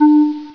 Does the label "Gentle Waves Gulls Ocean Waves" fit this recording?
No